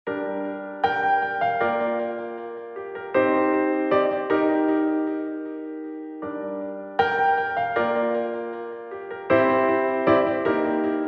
INST 78BPM KEY Bb

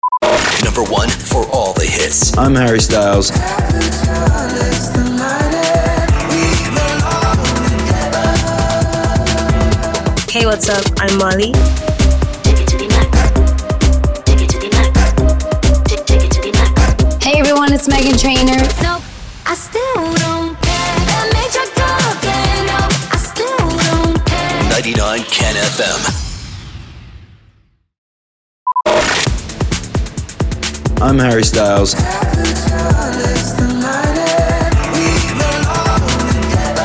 sweeper beatmix

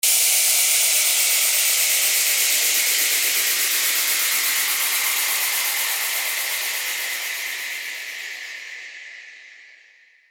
WHOOSH

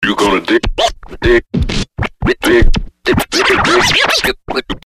scratch 100BPM

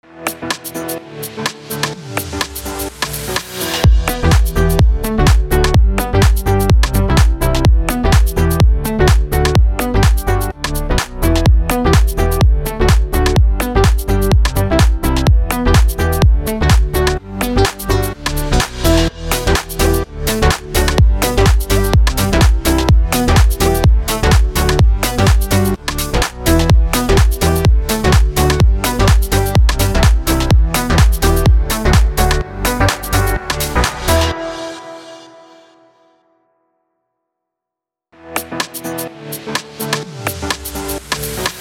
BED HOUSE GMAJ 126BPM

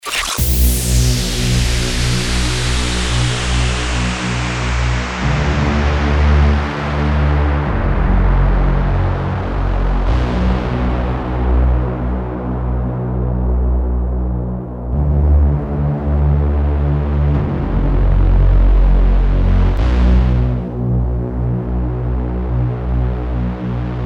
DRONE INTO THE DEPTHS 99BPM B MIN

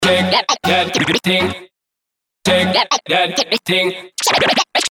SCRATCH 99BPM

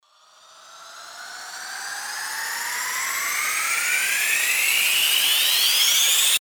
RISER

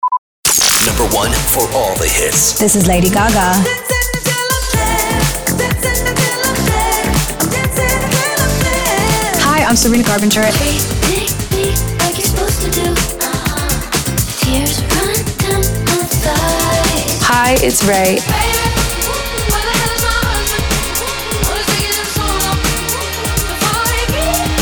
sweeper beatmix

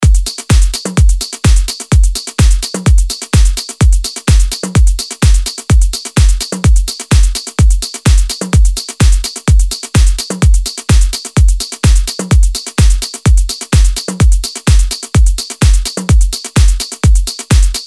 drum loop EDM 127 bpm

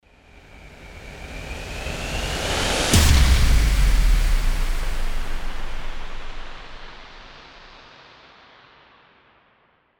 RISING IMPACT

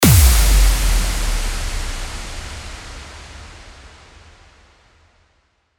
IMPACT

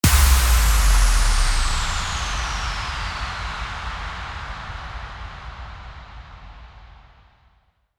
IMPACT

